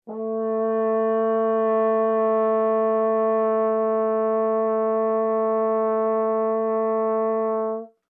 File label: HORN A4 MF LONG, French Horn in F; Alexander French Horn in F; Musical